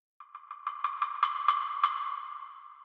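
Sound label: percussion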